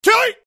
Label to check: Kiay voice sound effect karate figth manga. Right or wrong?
Right